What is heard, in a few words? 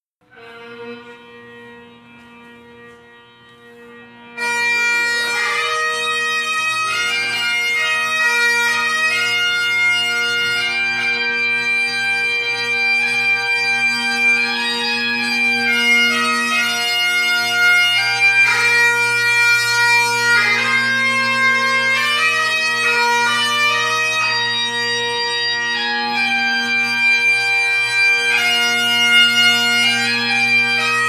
bagpipes